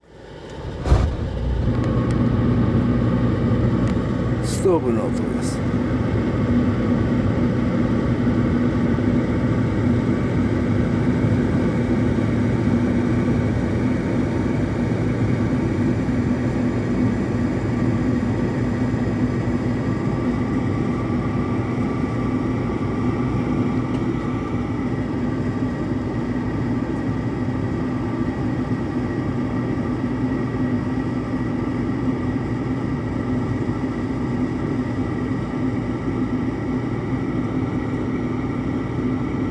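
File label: boiler combustion sound; ボイラーの燃焼音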